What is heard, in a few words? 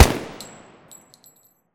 vector shoot; emx atlas